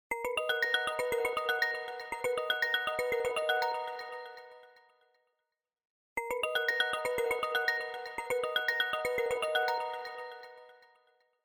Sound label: ringtone